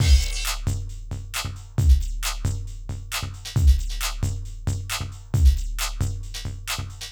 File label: Percussive